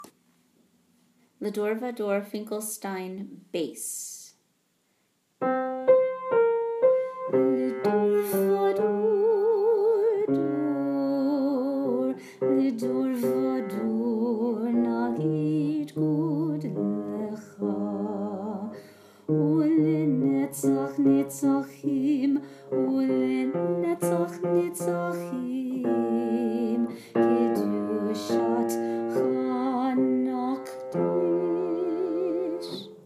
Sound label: Bass; High Holidays